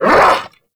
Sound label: Vocal Attack Short; Warframe; Duviri; Dax